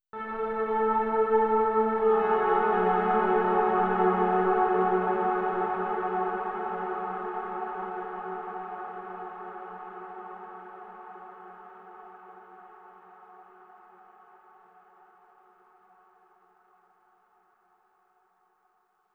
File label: Overlay Tone